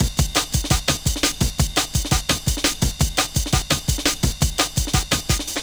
Kick Break, Drum & Bass; Fluid Dynamics

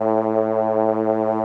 SOLINA, String Machines

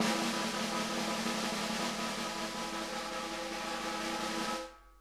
snare; Sonic Reality; Interactive Drum Kits

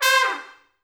C4, Pop Brass; Best Service; ProSamples vol.19; AKAI

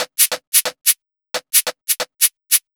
DRMS, Pure Electro; USB Soundscan vol.03; AKAI

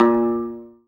ZIMBAL A#1; Best Service; World Colours

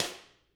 Drums, SNR; CAMCO

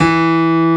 PIANO E2; Analogon

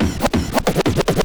SCRATCHES; Scratch 1

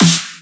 Dubstep Snare

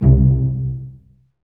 STR SEC, STRINGS; Roland - LA Composer vol. 1

